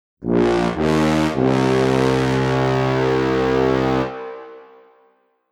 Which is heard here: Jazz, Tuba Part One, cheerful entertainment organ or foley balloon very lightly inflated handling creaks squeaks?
Tuba Part One